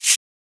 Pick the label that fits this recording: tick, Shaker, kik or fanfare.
Shaker